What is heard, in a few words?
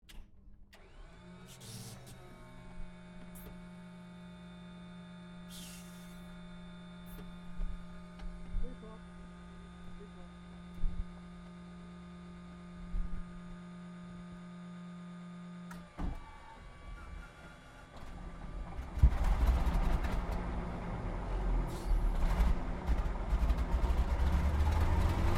AIRCRAFT P-40 KITTYHAWK STARTUP IDLE INTERIOR STEREO; P-40 Kittyhawk / Warhawk / Tomahawk; Airplane Sound Effects